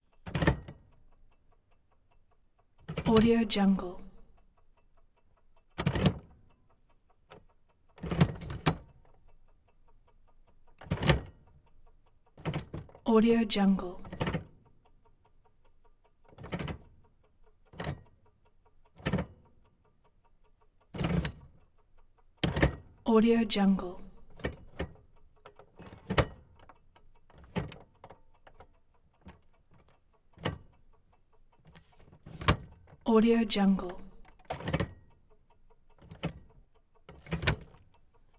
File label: 1971 chevy chevelle car gearshift; prosoundfx